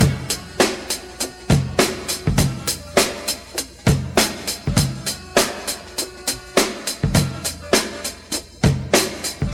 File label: 101 bpm drum loop sample c sharp key